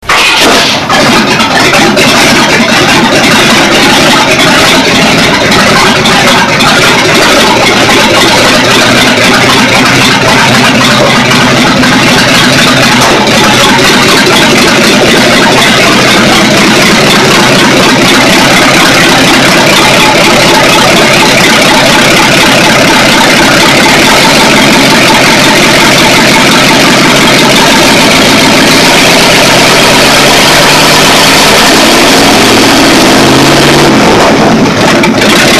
390 manifolds, running straight out of the (power sucking) manifolds, 1976 Ford F-150